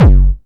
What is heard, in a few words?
Industrial Kick